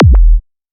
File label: Kick; LiLA'c Records PsyTrance Sounds 01